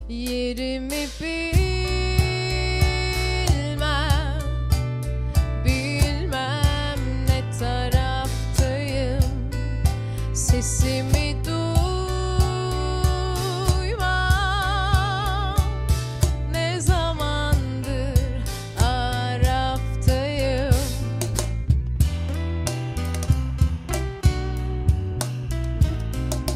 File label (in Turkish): Akustik